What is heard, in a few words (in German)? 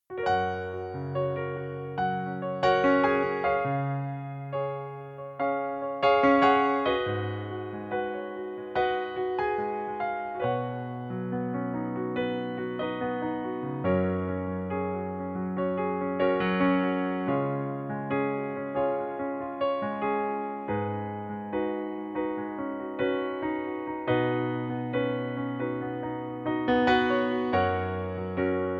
Pianoplayback F#-Dur